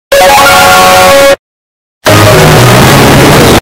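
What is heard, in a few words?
startup bass boosted; clash of clans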